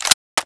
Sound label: sniper clip in